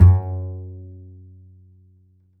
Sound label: A, upright acoustic bass, A string